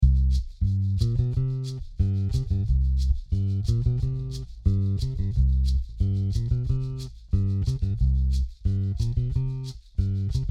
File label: Bolero